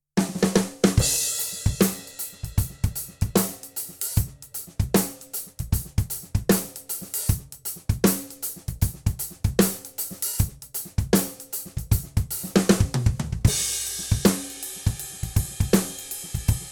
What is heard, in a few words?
Groove